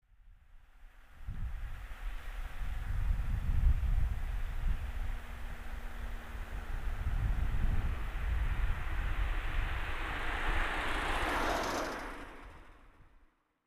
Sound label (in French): Voiture